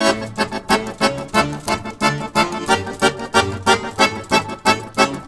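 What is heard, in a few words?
Balkanish Traditions accordion; USB Soundscan vol.63; AKAI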